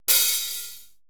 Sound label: 80S DRUM SET; Best Service ProSamples vol.55 - Retro Sampler; AKAI